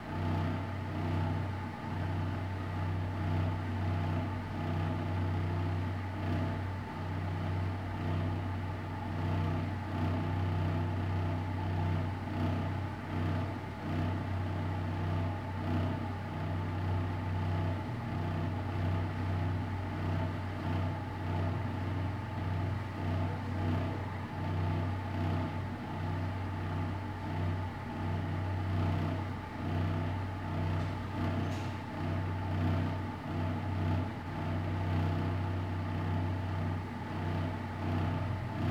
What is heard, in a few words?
Room tone Empty School Toilet medium, scary, dark, pulsating ventilation; Empty School Roomtones; Borg Sound